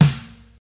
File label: puffy snare D key, snare drum sample tuned to the D note. Loudest frequency: 1186Hz